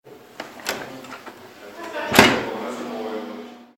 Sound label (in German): ep spuelmaschine